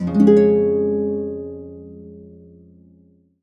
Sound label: HCDBMAJ F, Complete Harps; USB Soundscan vol.62; AKAI